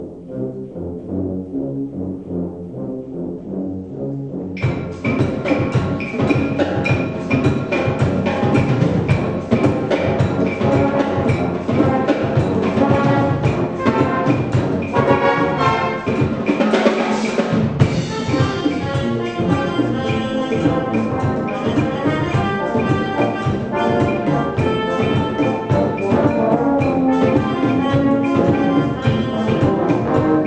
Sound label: in concert jazz, brass band